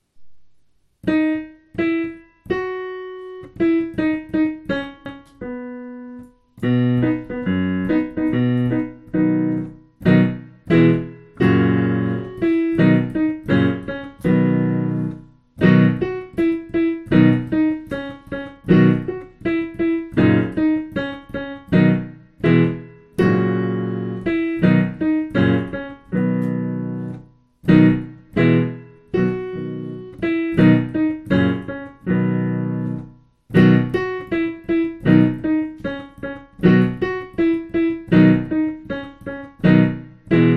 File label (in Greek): xeimonas piano